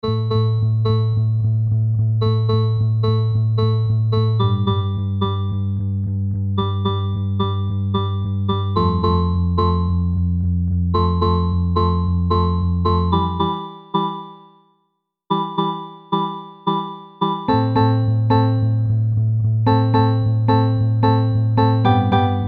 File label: Tension; Tower of Hell